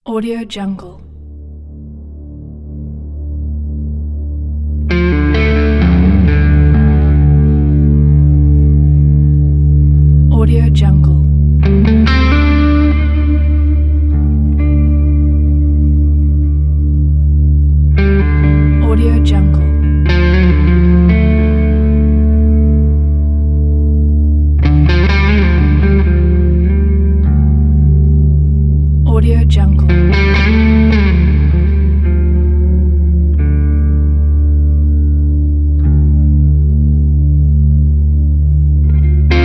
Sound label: western blues guitar